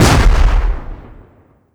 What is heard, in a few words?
helicopter rocket explosion; Rust